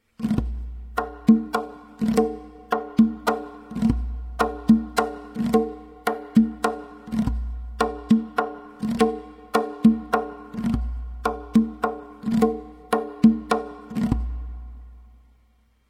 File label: tonbak